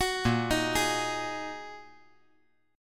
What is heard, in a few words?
Bb 7th Suspended 4th Sharp 5th, chord; 11,10,11,11